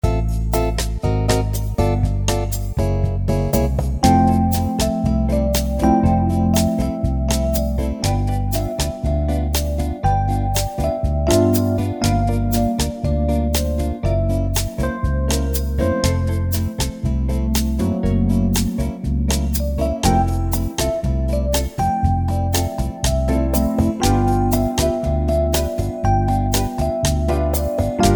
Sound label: Epiano Tenor Sax Bossa Nova; Wersi